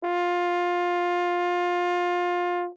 Horn ff F4, Brass; MIS Pitches - 2014

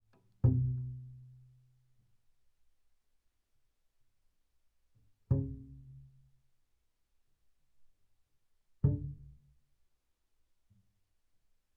Bass pizz sul E ff C3 E3, double bass

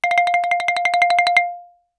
Xylophone hard rubber roll ff F5 stereo; MIS Pitches - 2014; Percussion

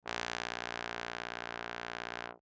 Bass Trombone ff Bb1; MIS Pitches - 2014; Brass

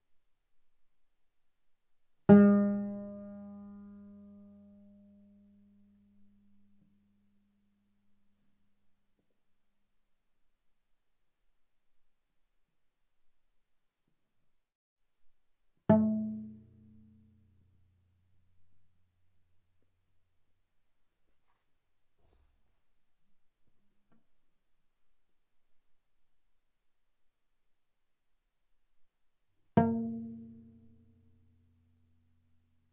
Viola pizz sul A ff A4 B4 stereo

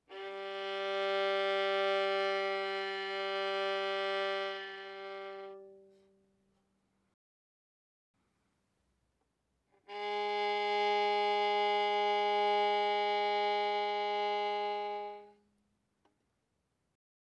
Violin arco mf sul G G3 B3

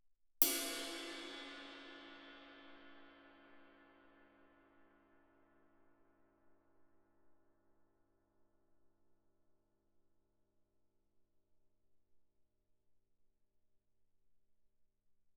crash stick normal ff, suspended cymbals; Percussion

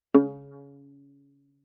Viola pizz ff sul C Db3; MIS Pitches - 2014; Strings

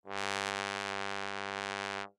Bass Trombone ff G2; MIS Pitches - 2014; Brass

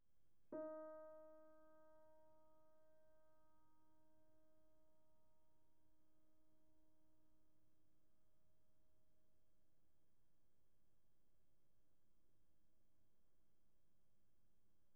Piano pp Eb4

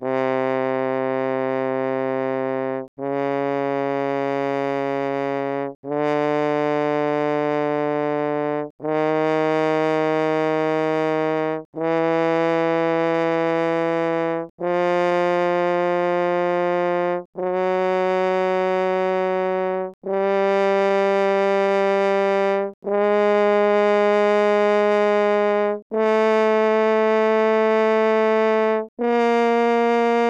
Horn, ff, C3 B3, french horn; Brass

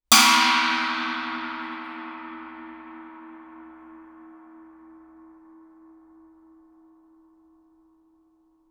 chinese stick normal ff stereo, Suspended Cymbals; Percussion

